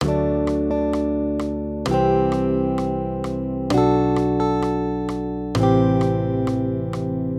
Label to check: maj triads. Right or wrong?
Right